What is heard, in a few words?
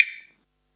clave; eddyflux